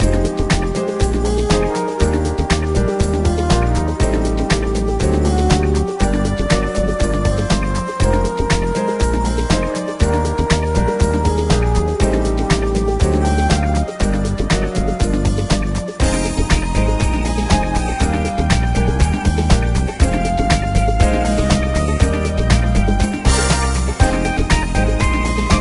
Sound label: Disco Dance Music; Wedding Dash; NDS